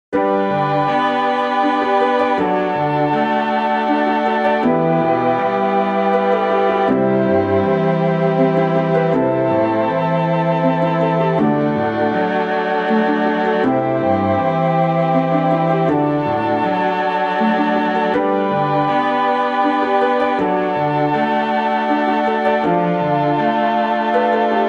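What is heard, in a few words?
Low Accompaniment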